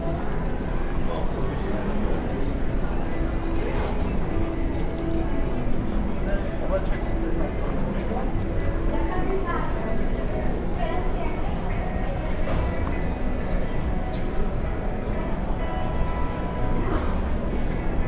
Airport Ambience

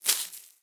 Tumbleweed Impact

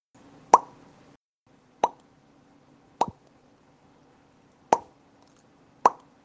Pop

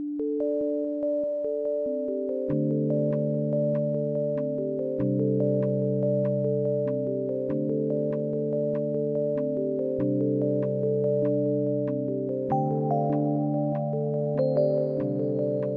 Lo Fi Loop

